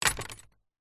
household gate wooden with metal latch close wobble rattle; zapsplat